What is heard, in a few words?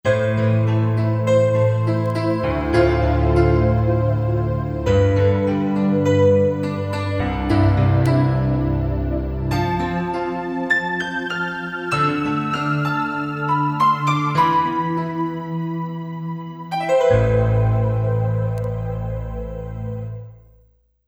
LA Studio